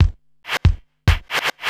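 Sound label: DRUMLOOP ELECTRO 140; Techno2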